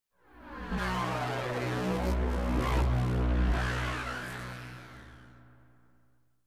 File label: stinger; Myst III Exile